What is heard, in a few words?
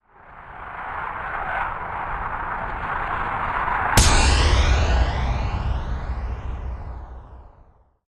Rumble And Big Impact Sweep